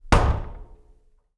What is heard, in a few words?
Door Bang Rattle; MinorHallutinationsSounds1